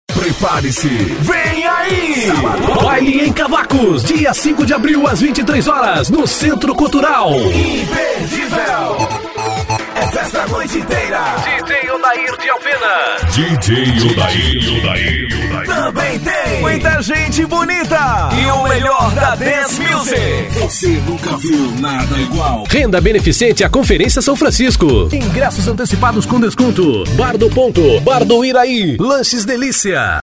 baile

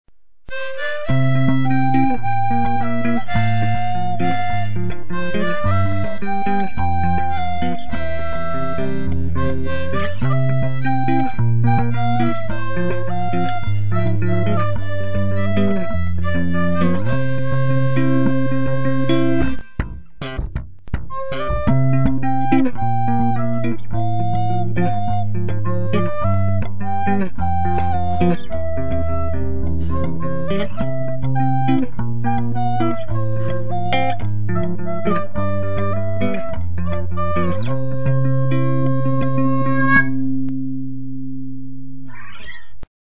contrabaixo flauta harmonica

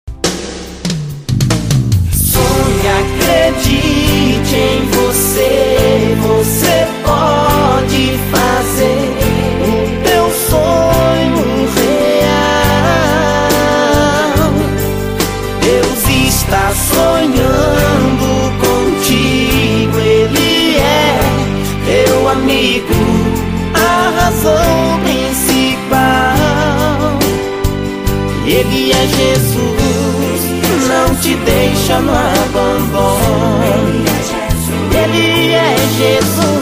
toque pra celular

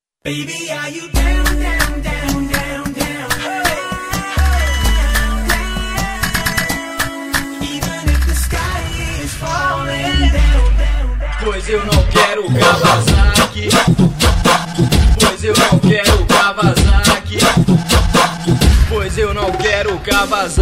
funk remix

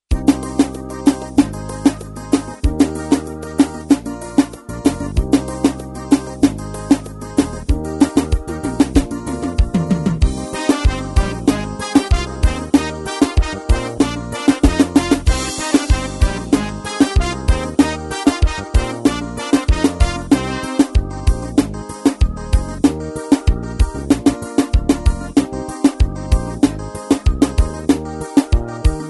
forra do bom

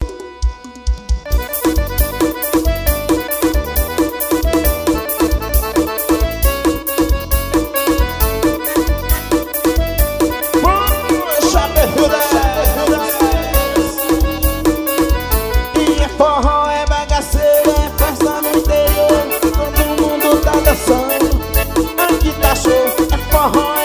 forrozão ao vivo

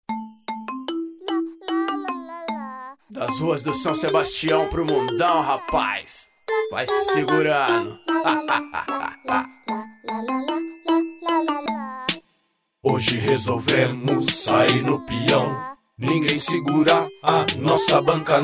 rap, intro

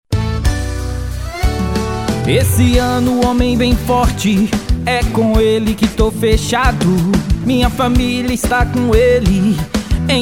jingles politicos; eleicao 2020

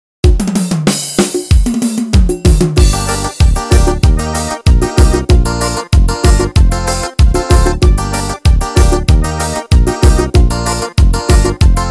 forró

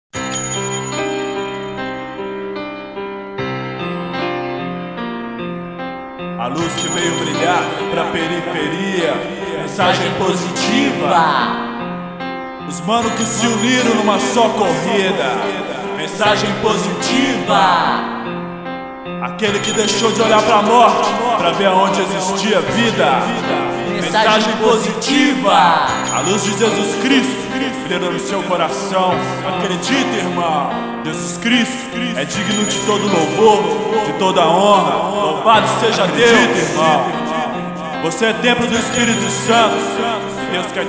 rap educativo

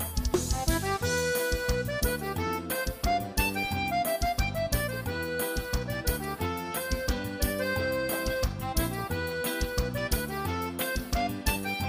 cantora

